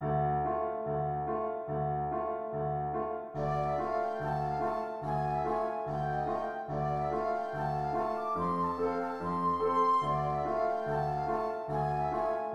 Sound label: in the hall flute